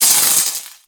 Sword Elemental Electricity Whoosh; Enemies; Weapons; Warframe; Teshin

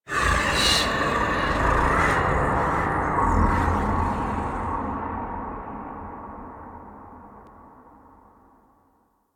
Sky Breaths, Ambience; Warframe; Duviri

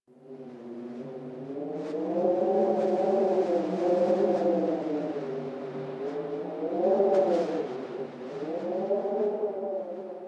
Landscape Cave Wind Howl, Ambience; Warframe; Venus Open World; One Offs

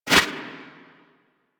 Tengu turbulence deflect; Warframe

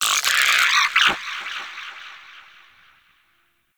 Vocal Pain, Shrimp Lantern, Creatures; Baliq

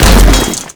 Bodyfall; Foley; Warframe; Tenno

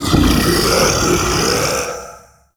Male Vocal Breath Heavy; Enemy; Mockeries; Sub Boss; Mockery

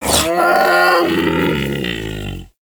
Predasite Vocal Tranquilized; Warframe; Hunting Critters

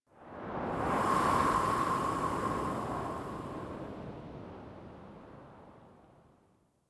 Wind Gust Calm Toxic; Ambience; Misc Outdoor; Warframe; Infested Eris

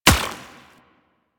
Kinetic Plating Shield Hit; Warframe; Warframes; Runner

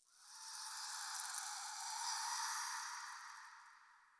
Wind Spine Grass Scream Gust; Ambience; Misc Outdoor; Warframe; Infested Eris

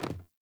Sandals Land Wood, Footsteps; Characters; Player

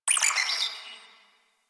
Tech Data Beep; Warframe; Ambience; Large Ramp Hall

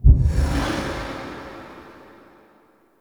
Device Transition Open; Sacrifice Vitruvian; Warframe; Quests; Chamber

